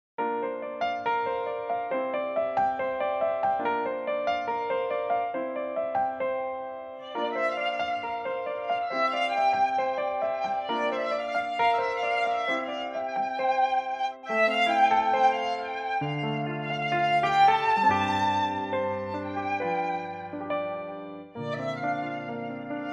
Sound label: piano cover violin